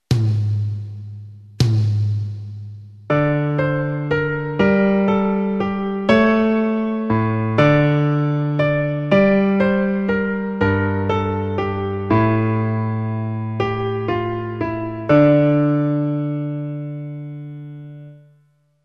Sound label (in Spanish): 2 voces